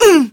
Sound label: piglet hit; Bad Piggies